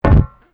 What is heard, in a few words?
walk metal; gamerip; shattered horizon 2009